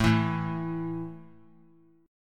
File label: A 5th A down, chord; strummed